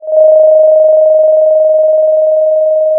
Sound of a phone ringing

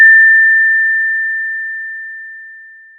pop, Sound of a doorbell

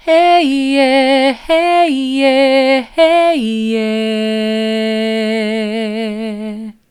hey soft, vocal

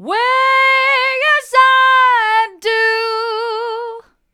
way as i do hard, vocal

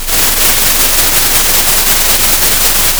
pop, Sound of a thunderstorm

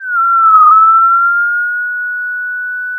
Sound of a police car siren

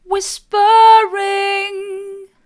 whispering mid

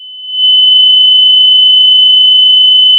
Sound of a tea kettle whistling

